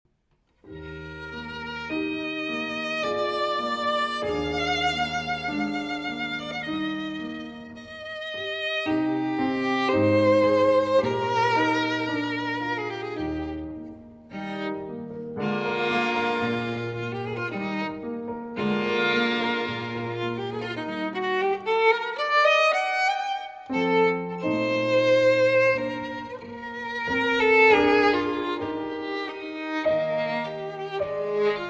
Sonate B-Dur, Andante

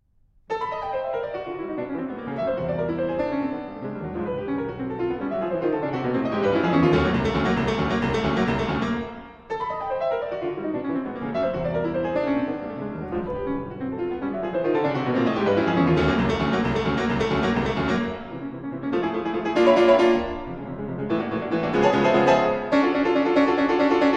Berceuse für Klavier pianoforte

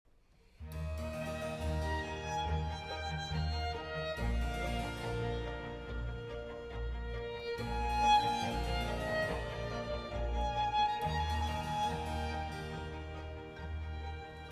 Violinkonzert Nr. 4 f-Moll Largo; Die vier Jahreszeiten; RV 297 Der Winter II.

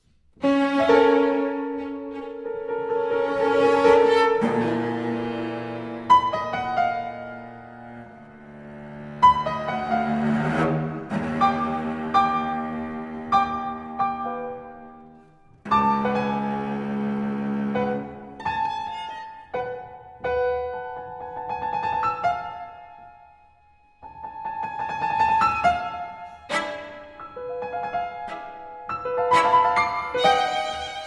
Klavierquartett; Zustände III. Bright, Alert

